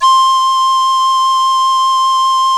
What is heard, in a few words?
Recorder; GMPlay; Pipe